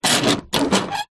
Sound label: vehicles truck rear door metal latch lock turn grind